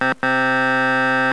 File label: RDCS Wake up alert